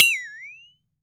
FLEXATONE; AKAI S-Series CD-ROM Sound Library VOL-5

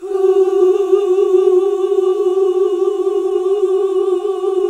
Gosp. Oooh; Sound & Vision - Gigapack II CD 1 (Roland); HAL Gosp. Oooh 1

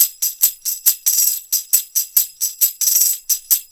Roots Reggae; Sounds Good - Roots Reggae

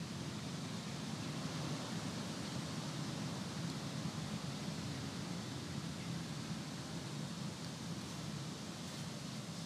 Ambi Loop, outdoor samples Ambience Loops

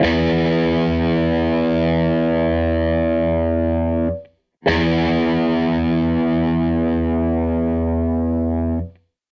Gretsch Guitar Loop

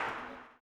CLAP, blues & soul; ILIO; Vocal Planet

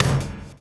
DOWN, DOWN KIT 78

